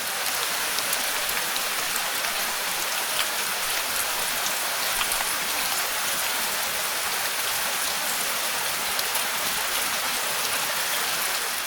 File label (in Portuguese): Chuva na Cabana